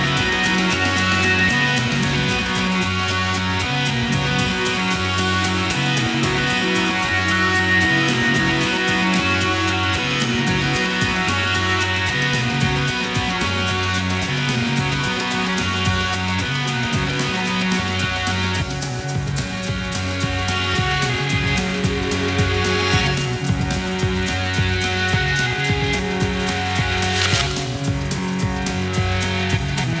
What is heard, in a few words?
rock